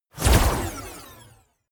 whoosh short jinx

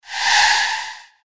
hf sweep

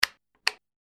light switch on off

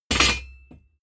plate armor piece drop

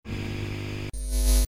electric hum

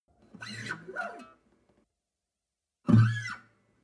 western guitar slide noise

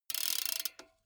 bmx bike crank freewheel slow long

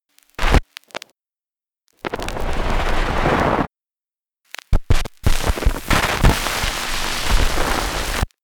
scratch

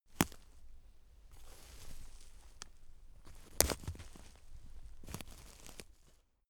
step on twigs

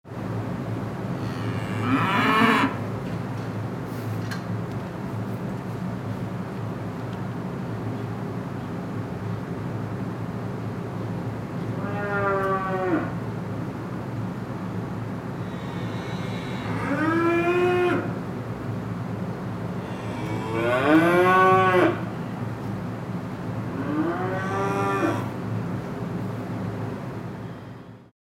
cowshed with fan

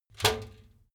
trash basket metal hit rattle high

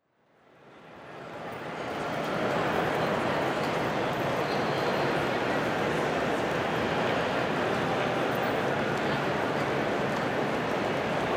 grand central station nyc

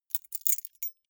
key ring small place

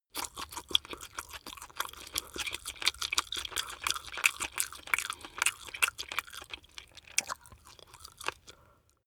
chew spaghetti smack fast

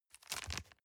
plastic bag small turn soft

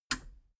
metal button radio release power on

